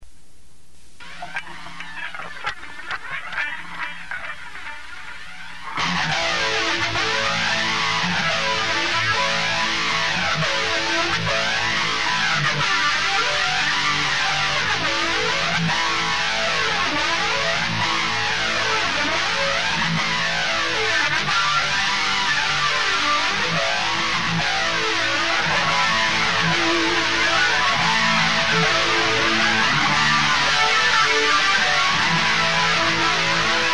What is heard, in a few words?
Metal E